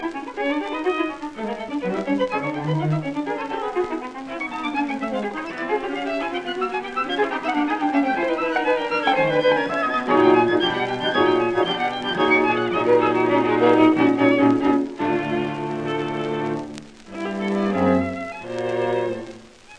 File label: String Quartet No 2 Op 10 II Sehr rasch; Schoenberg